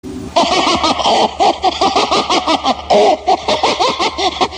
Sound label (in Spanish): risa ciervoooooo